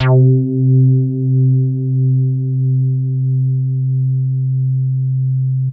Big Synth Basses

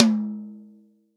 REAL DRUMS POP KIT

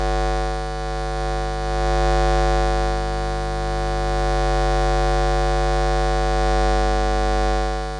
Electromagnetic Fields

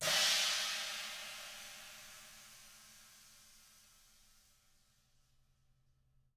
SWISH RIDE; ILIO; Double Platinum Drums 1

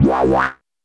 Synth Hit C

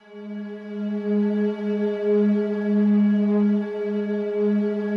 SLOW STRINGS 2 G#2 R, Yamaha VP-1; Music Store; 90's Sample CDs

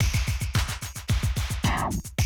Classic DrumLoops, 110

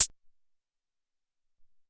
Tek Hat, Alesis SR-18

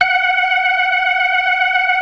ORGAN, 4 DRAWBARS; Keith Emerson Signature Series

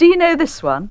VOX GENERAL; AMG Megabass REMIX! VOL-2

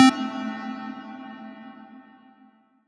Bleep Hit; Big Fish Audio; OSH Chords

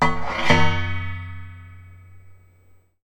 Raw HiHat, contact mic; musicradar; contact mic madness

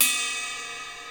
cymbal; E-MU Formula 4000 Series Vol. 1; Hip Hop Nation; Bonus Perk

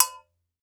GANKOKWE, African Perc

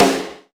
Room Snare, Roland BK-5; Snares

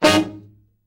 Killer Horns, SECTION 7TH; Best Service

